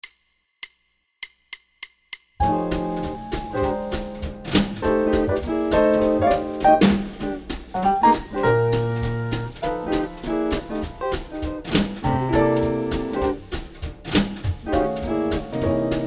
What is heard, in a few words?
Swing Blues Basic 200bpm F